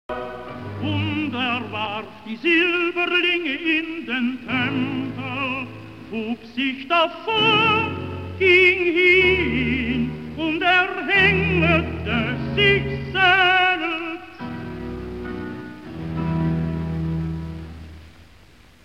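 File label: soprano aria, J. S. Bach Passion; J. S. Bacho Pasijos pagal Mata soprano arija Blute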